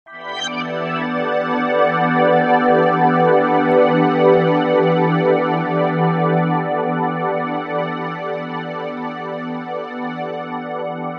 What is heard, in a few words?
jazz relax